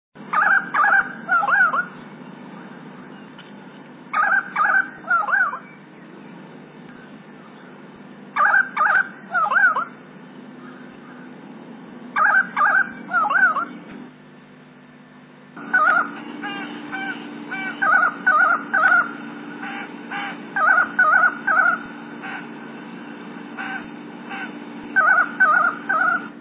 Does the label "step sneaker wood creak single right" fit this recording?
No